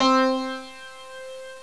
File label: piano; Descent II